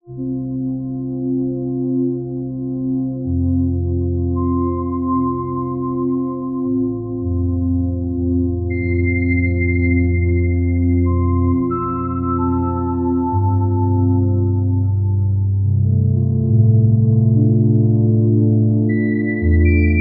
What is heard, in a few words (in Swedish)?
rymdmusik